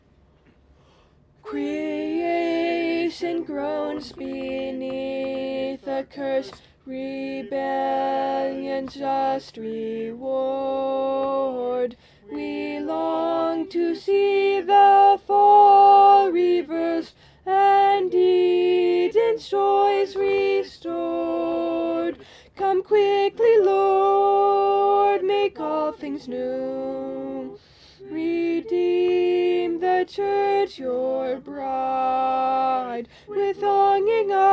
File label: Alto